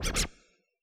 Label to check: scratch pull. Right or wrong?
Right